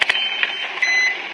equip, ambient tones; Source Engine; cstrike

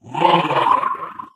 ballbot impressive

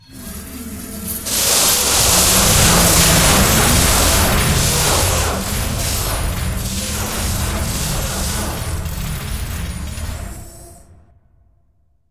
trap linear

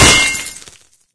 wrench hit build success; tf

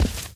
walk tile, footsteps

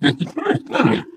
ballbot agreement

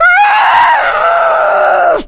female mad scream, witch voice; npc; source engine; left 4 dead 2

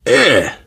reaction disgusted, biker, voice; source engine; left 4 dead 2

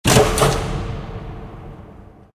lights off, ambient; Source Engine; tf

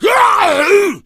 hunter pounce

